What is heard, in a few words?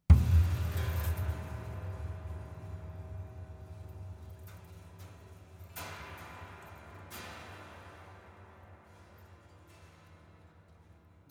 Piano Hits Rattle